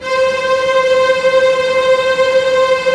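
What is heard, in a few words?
TREM C5, tremolo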